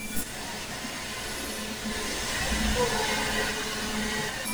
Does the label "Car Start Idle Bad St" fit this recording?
No